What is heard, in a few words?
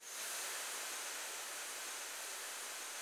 CHO, Women-Combi; Sound & Vision - Gigapack I CD 2 (Roland)